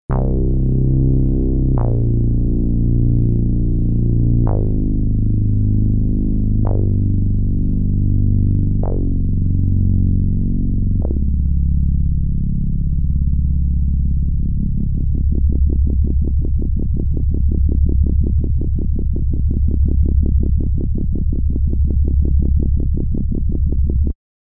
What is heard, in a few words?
Minimonsta all funk bass